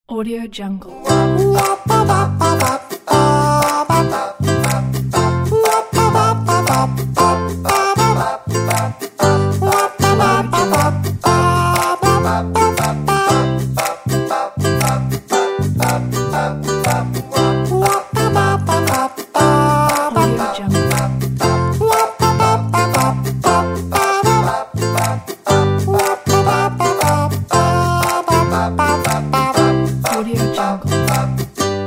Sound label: ukulele vocal